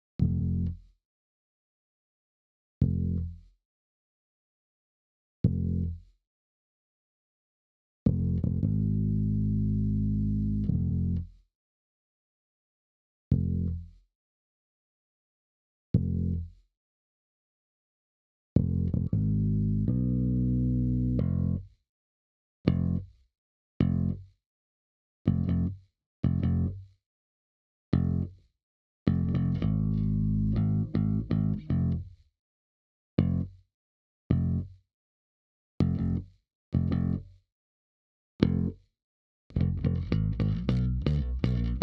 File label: Bass